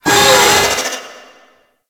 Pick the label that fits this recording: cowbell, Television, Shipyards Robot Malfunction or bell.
Shipyards Robot Malfunction